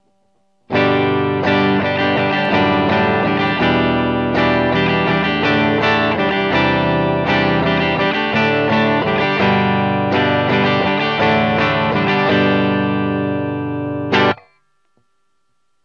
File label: clean